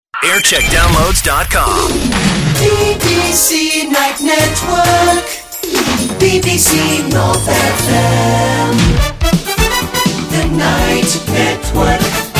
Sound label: jingle montage; bbc north